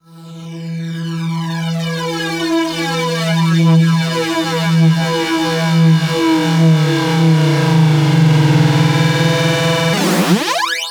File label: Sweeps Long